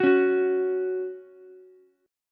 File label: Gb 5th Gb down Ukulele; Gb5 strummed; JGuitar